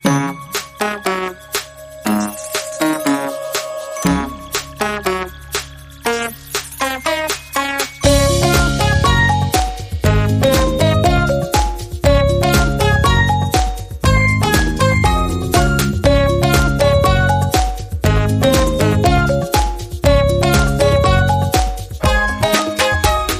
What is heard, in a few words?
Kid Calypso